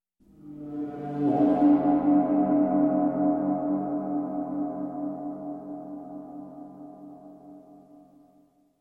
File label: Suspense Gong Echo